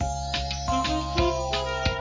Tv add diamonds

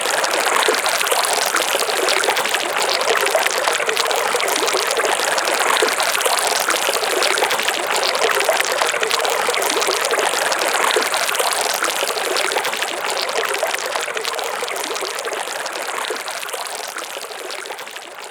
Swimming in Ink; Multiplayer SFX

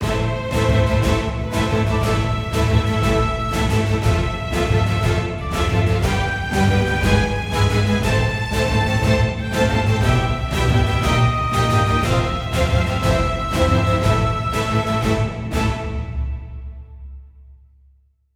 heroic forte

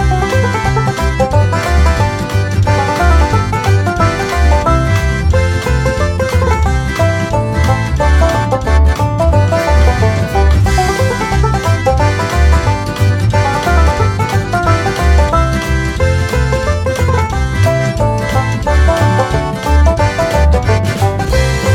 Summer Celtic Jig; Meow Motors

